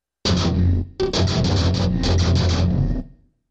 Solo Guitar Riff

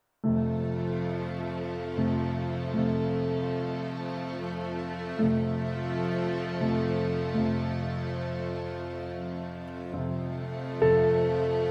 No Cello

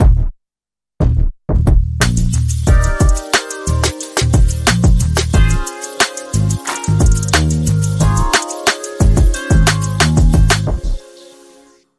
Sassy Saxophone; 15sec

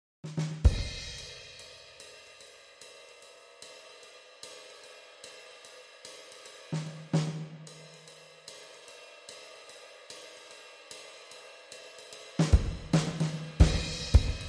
Drums Clean

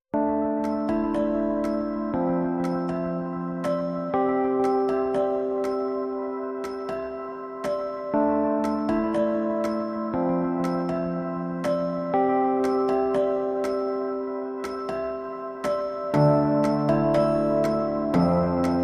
No Piano Melody No Celeste Arpeggio Mix

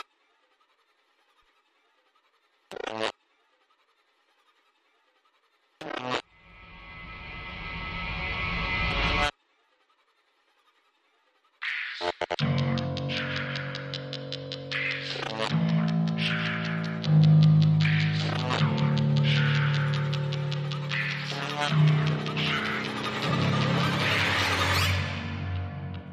Distortion, Soundscape

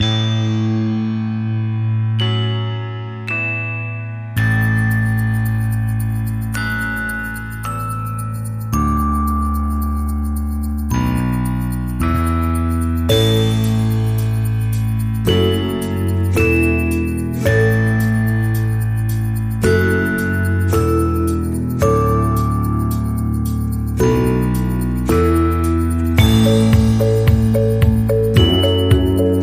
Upbeat

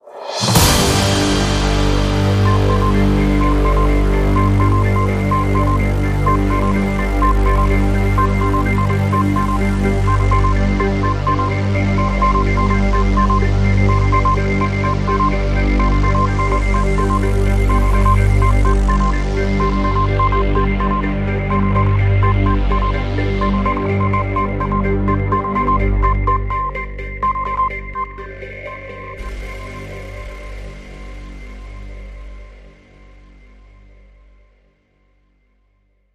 News Alert

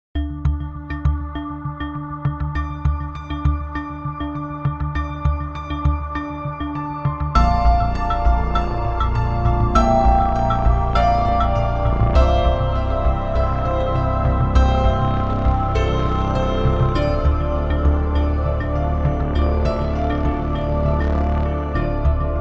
Emotions Run Deep, No Drums

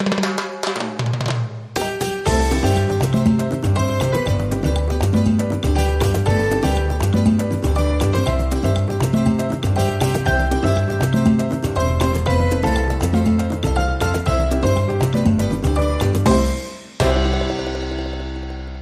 Salsa Happy Birthday

